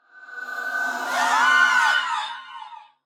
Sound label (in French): Banshee Cri; Phasmophobia